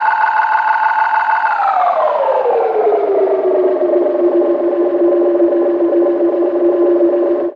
Tape Delay Feedback; radiosonics

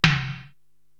TOM Dirty Funk, Korg TR-Rack

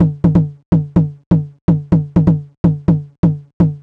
drum loop percussion 125; Sample Magic - Classic Chicago House; Drum Loops

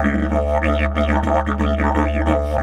Didge 93 BPM, Didgeridoo; Big Fish Audio - Didgeridoo and Other Primitive Instruments

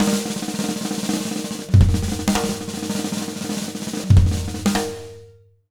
100 B BEAT, Jazz Latin Drumloops

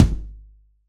Rm Kick alt; Roland BK-5

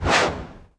cinematic impact wipe

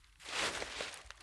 Trudge Step, outdoor, Footsteps

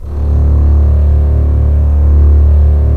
STR, Roland LA Composer

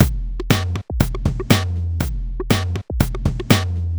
Ricochet, Drum Loops, 120 BPM; Sonic Foundry (Sony Creative Software); Underground UK House & Electro

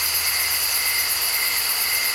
night bug, Insect Ambience; SFX

